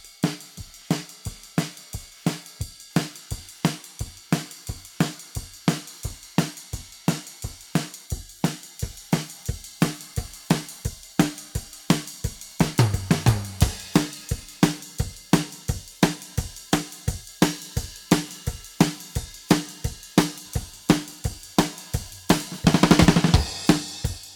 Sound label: pattern, drums